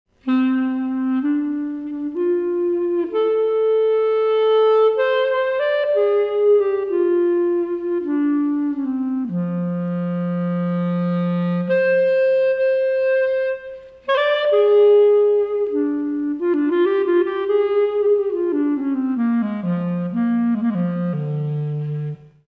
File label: Mic Shure SE110, clarinet